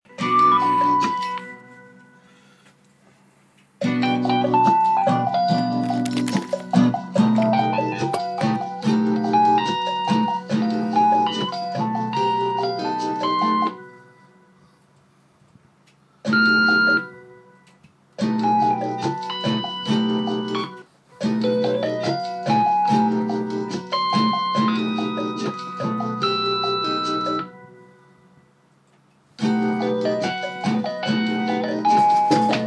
Fender Rhodes overdub session

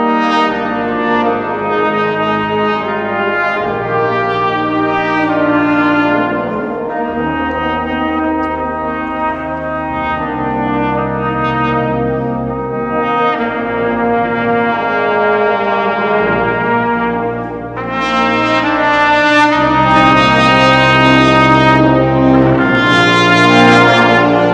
brass band version

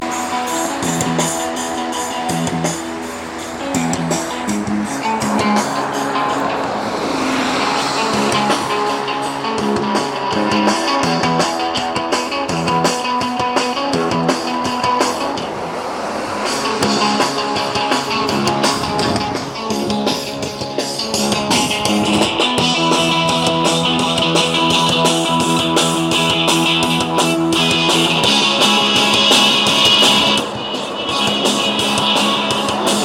band playing on london bridge

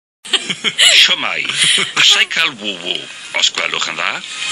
woo woo

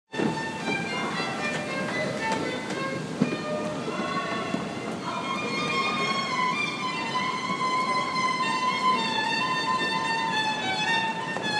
violinist at st paul s tube

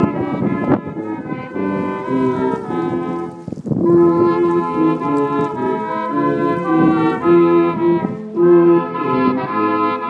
silver band carols; muker; outside parkers

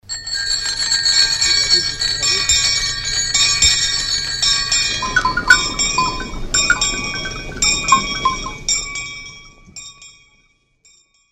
bells

